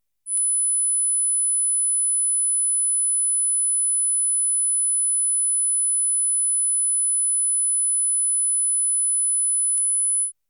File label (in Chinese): 10KHz 測試訊號; 雨果金碟